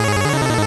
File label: 8bit se